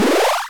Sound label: pillar Activated; fyresmodjam